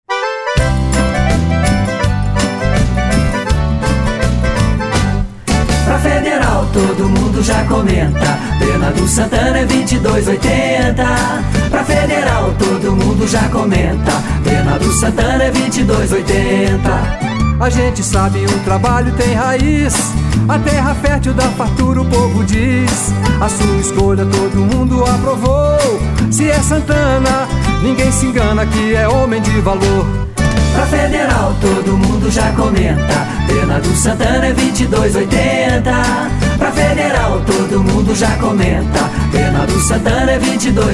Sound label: jingle forro